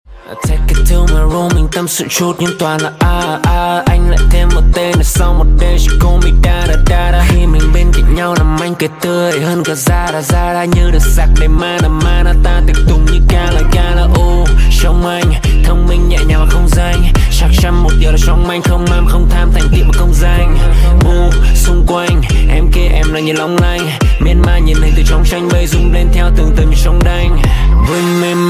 nhac chuong rap

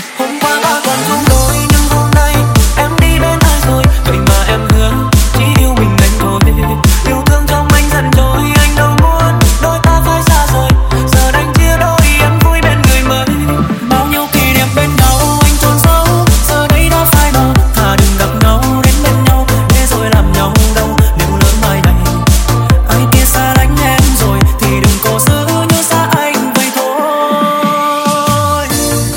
Nhac Chuong Remix

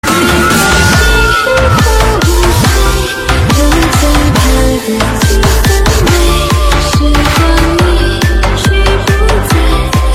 nhac chuong remix

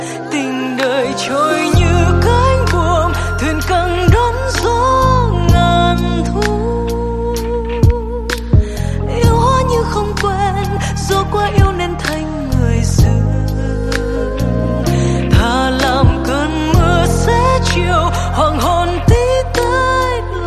Nhac Chuong Lofi Ver

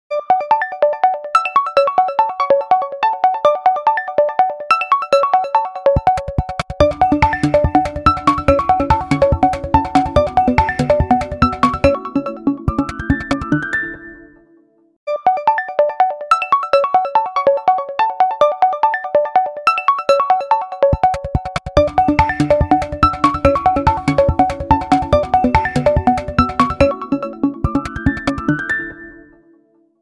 khong loi oppo k11x

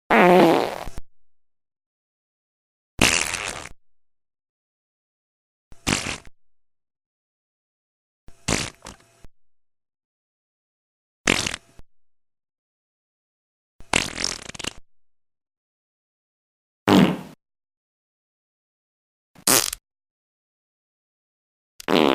nhac chuong tieng xi hoi

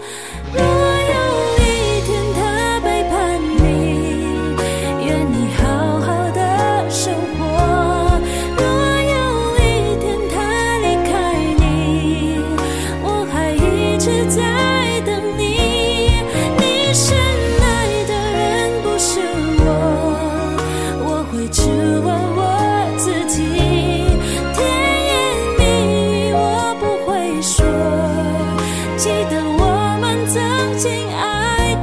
Nhac Chuong tieng Trung Cover

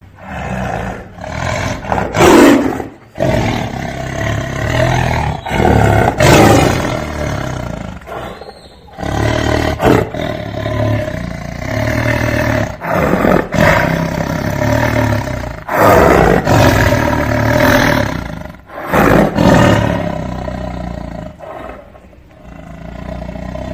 tieng ho gam

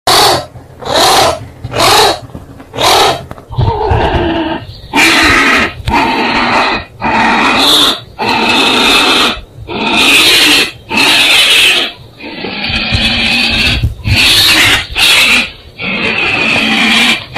Tieng Chon Hoi keu

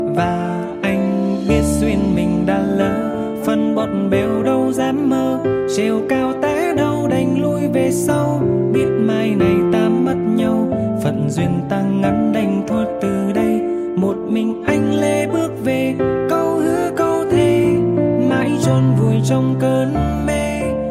Nhac Chuong Piano Ver

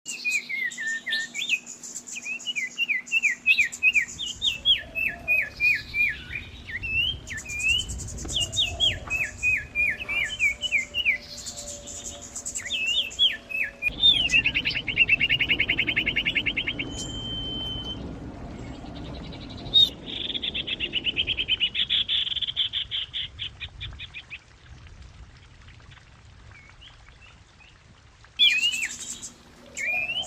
Tieng chim Huyt Co hot

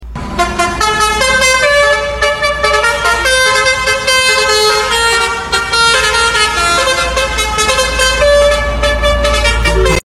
tieng ken basuri tieng coi basuri

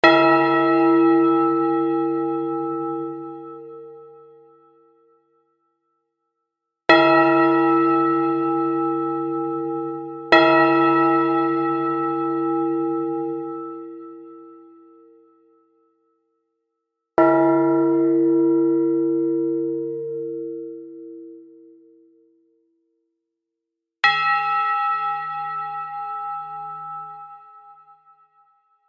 am thanh tieng chuong vang vong nhieu tieng

